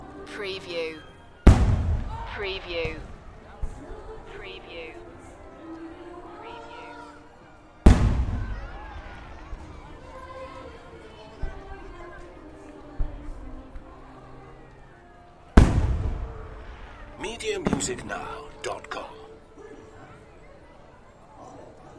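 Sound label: Fireworks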